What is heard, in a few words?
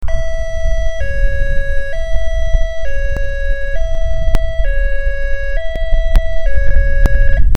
Hi Low, siren; whelen